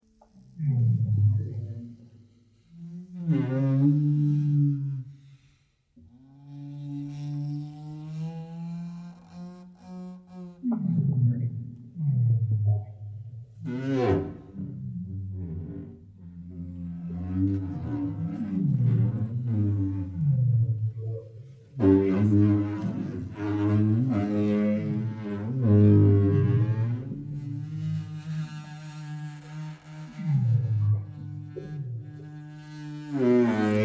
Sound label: solos cacophony cello; six seasons; season 5; instrumentation lab